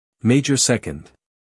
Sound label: major second